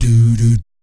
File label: DOO DOOT, Vocal Planet Jazz